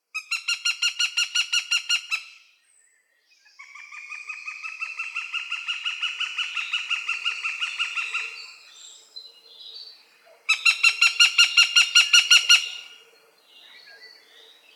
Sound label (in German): Habicht Accipiter gentilis, Vogelstimmen